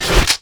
charged shot; LoL; graves

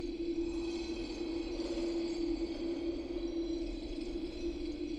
troll smash loop; trundle; LoL

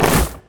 basicattack; maokai

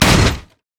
steam golem basic attack; LoL; blitzcrank

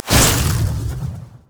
frost scorched earth; LoL; shyvana

